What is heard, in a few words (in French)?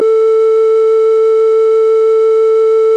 instrument la flute pan